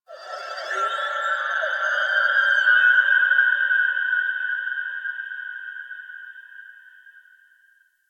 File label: Exterior Organic Drones, Ambience; Warframe; Rail Jack